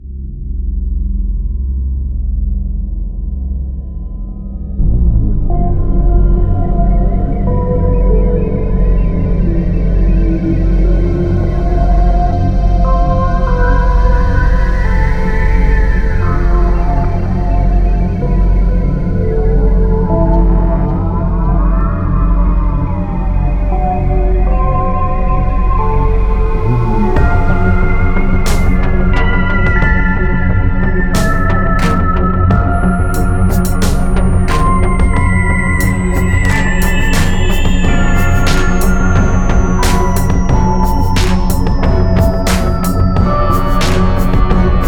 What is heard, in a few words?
Intro Ocean; Professor Lupo